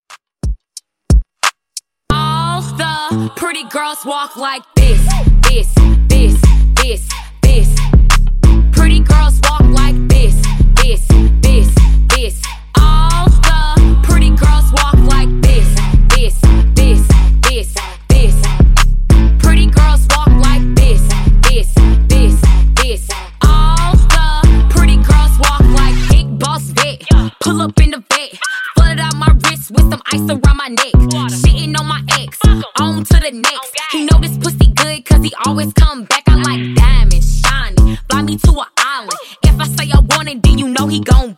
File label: Hype 90Bpm